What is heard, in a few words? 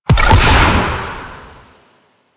Eye Beam Charge Fire; Ambience; Orokin; Warframe; Orokin Moon